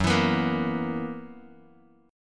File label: Gb 11th, Bass Standard; Gb11 strummed; JGuitar